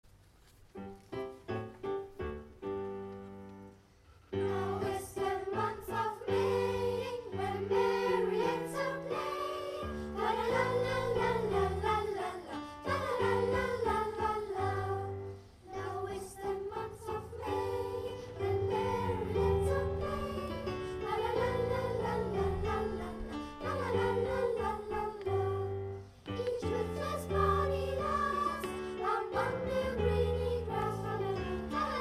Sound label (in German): Unterstufenchor Now is the Month of Maying